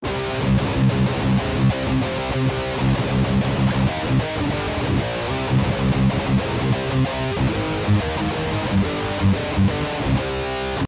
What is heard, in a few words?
volt bridge dirty